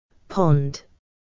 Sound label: pond